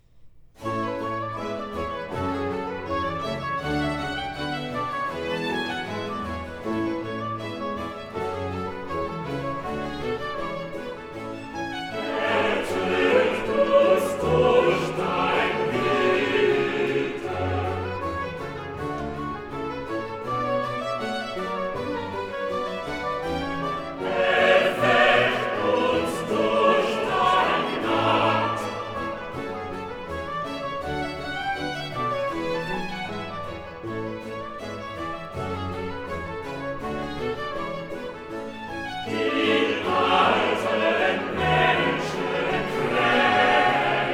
Choral; Cantata, BWV 22 'Jesus nahm zu sich die Zwölfe'; 'Ertöt uns durch dein Güte'; Bach; Cambridge; Quinquagesima

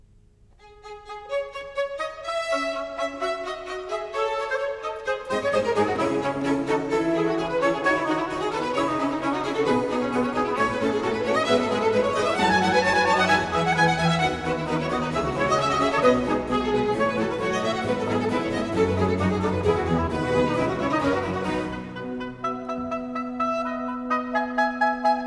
Concerto Grosso in F major, Allegro; Georg Friedrich Händel; English Baroque Soloists, Sir John Eliot Gardiner; Concerti Grossi, Op. 3

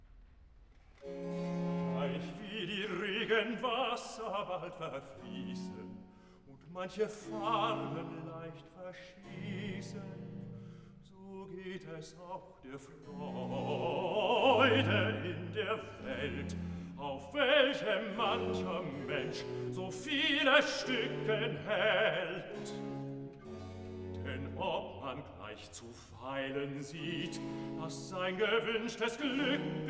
Cantata, Recitativo (Bass); BWV 166 'Wo gehest du hin'; Bach; Warwick; 4th Sun After Easter

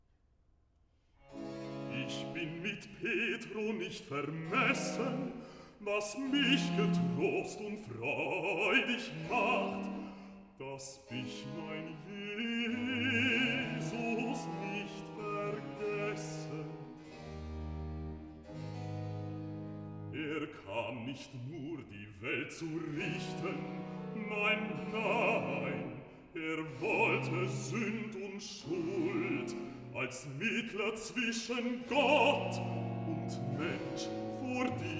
Cantata, Recitaitivo (Bass); BWV 68 'Also hat Gott die welt geliebt'; Bach; Long Melford; Whit Mon

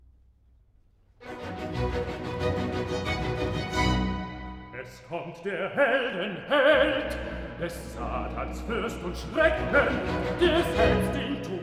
Recitative; Johann Sebastian Bach; Gott faehret auf mit Jauchzen BWV43; Archiv

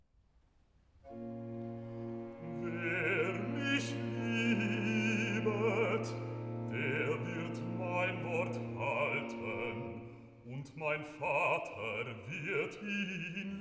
Cantata, Recitativo (Bass); BWV 172 'Erschallet, ihr Lieder, erklinget, ihr Saiten!'; Bach; Long Melford; Whit Sun

